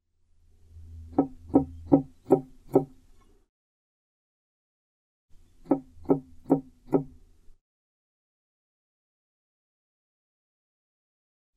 southern oak bush cricket

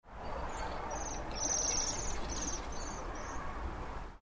waxwing, kilmarnock

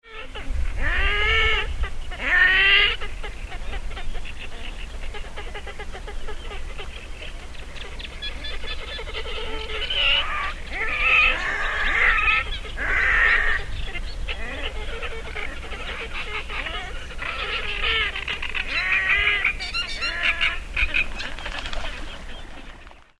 water rail

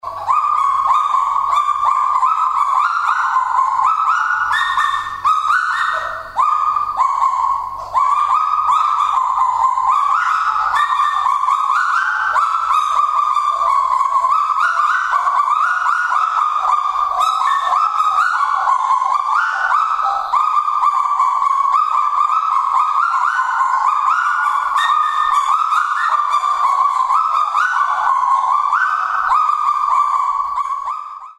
kagu

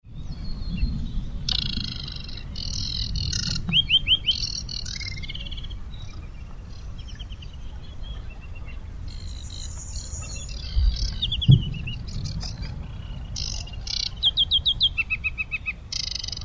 aquatic warbler

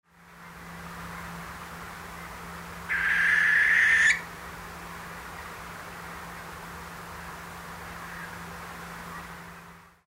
barn owl nocmig

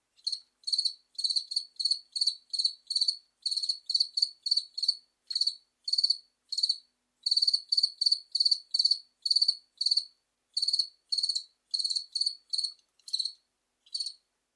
southern field cricket

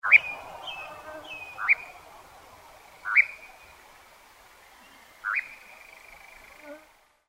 nightjar

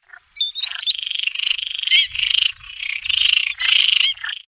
green sandpiper

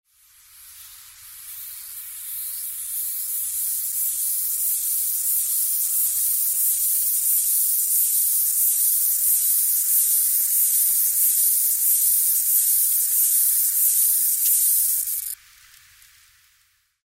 stripe winged grasshopper

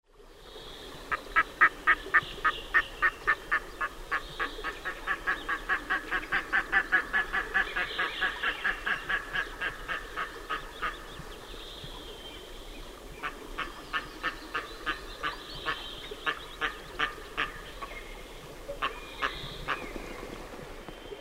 red throated diver

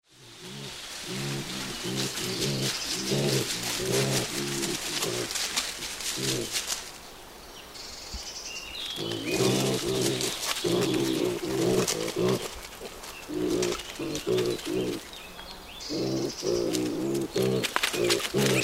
wild boar

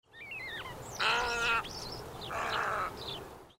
glossy ibis

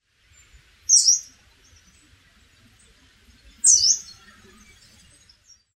yellow browed warbler

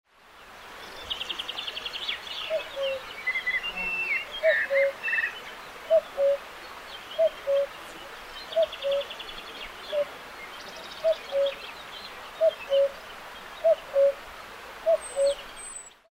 cuckoo

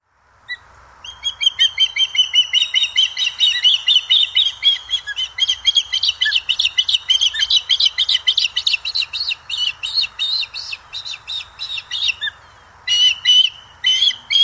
osprey

